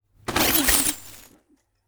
Whoosh, Bow Arrow Shoot, Magical, Surreal, Science Fict; Sound Design